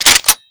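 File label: weapon shotgun double reload close; doom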